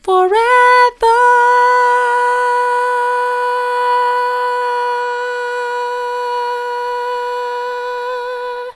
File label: forever soft, vocal